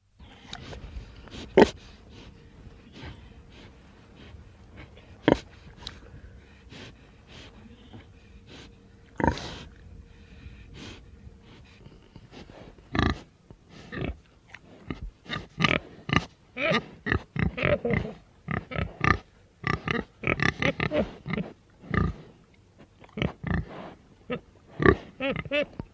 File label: animals, “Oink.”; pigs; bbc